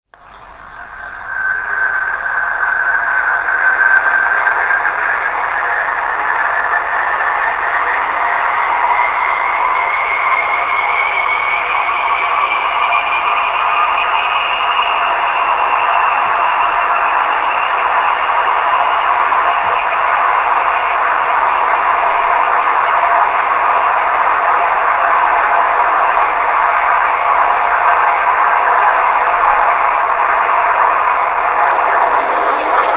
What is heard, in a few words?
canberra engine start